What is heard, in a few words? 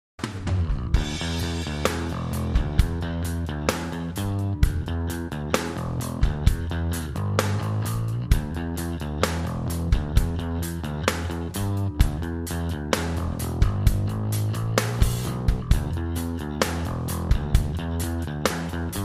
smoky drums bass